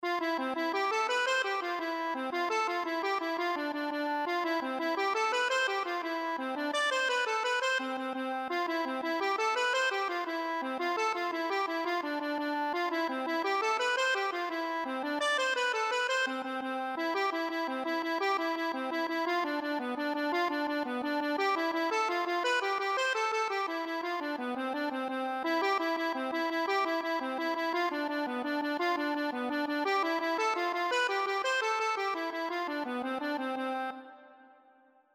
absent minded, accordion

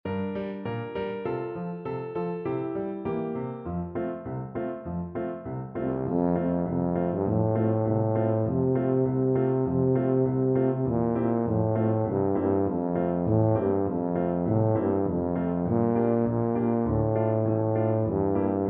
adon olam, tuba

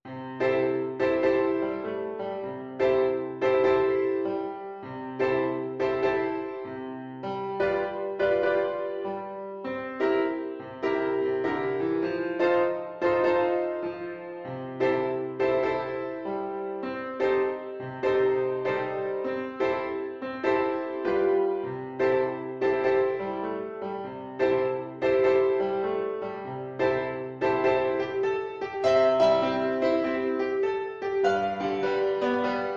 tohora nui, Flute

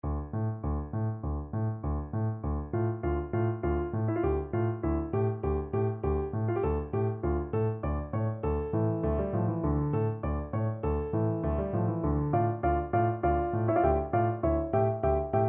danses des cygnets, piano duet

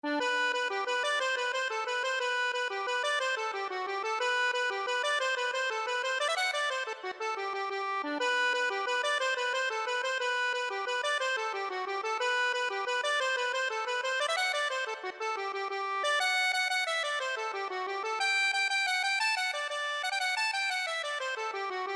hartigan, accordion

